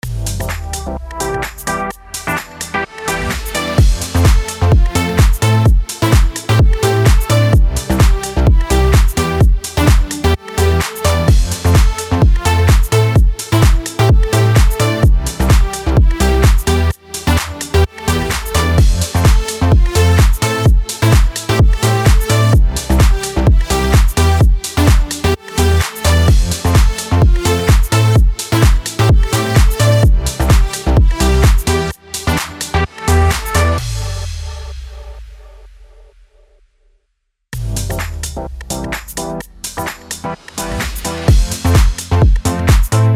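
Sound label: HOUSE F minor 128BPM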